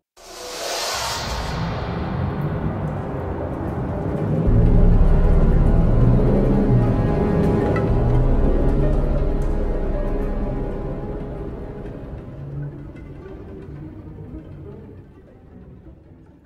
Suspense Theme

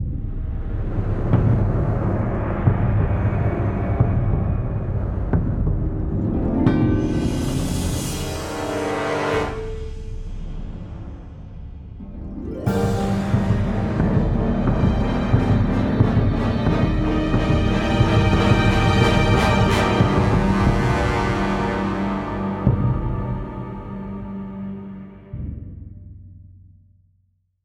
Shock

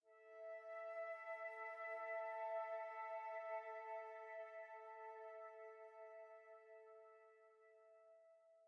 Env Field Day Ground

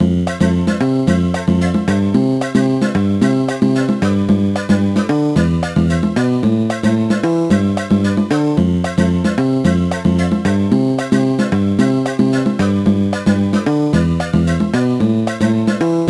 Menu; Lion King; Disney's Extreme Skate Adventure; GBA

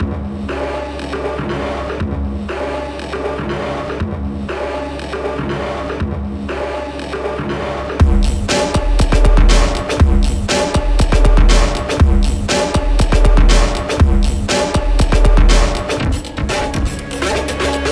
Music Action Loop; Instinct

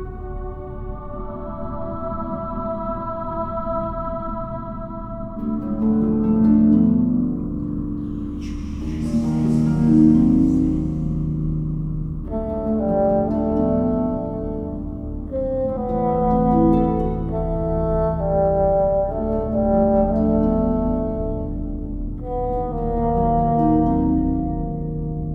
Exploration Mystery (loop), RPG Fantasy Music; Sofiya and the Ancient Clan; PS4, PS5, Switch; RPG Fantasy Music Pack

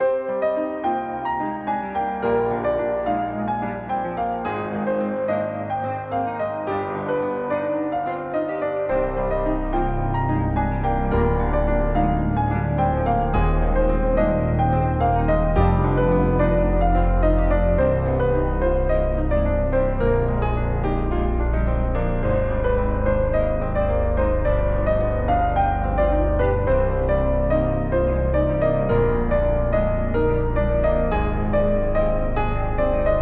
music boss; Phantom Rose

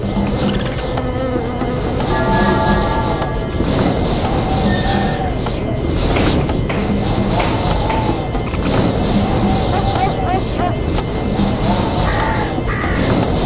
Border Ambient; Gore: Ultimate Soldier

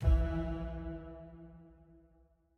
Stinger Neutral E; Desert SFX

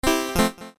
Retro off

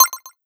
Reward Granted

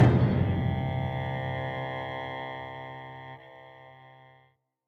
High Action Stop 105bpm; project zomboid

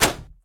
Catapulte meca; Puddle Plus

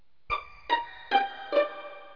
Sting; Clue

